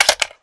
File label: g36 clip lock, weapons; garrysmod